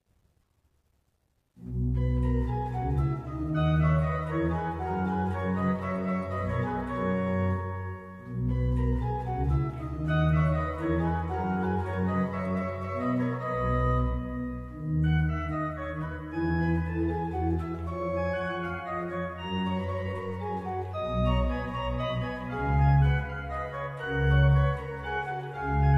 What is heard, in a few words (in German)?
trio orgel